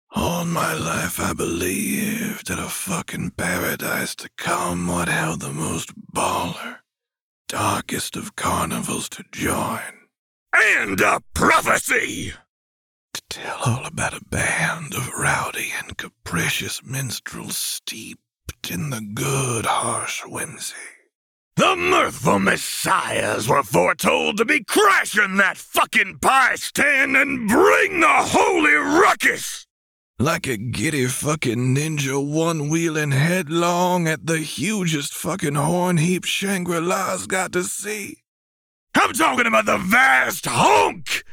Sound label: HONK; gamzee; trollcall